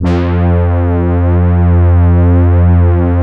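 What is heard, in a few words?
synthesizer, digital; Sound & Vision - Gigapack I CD 2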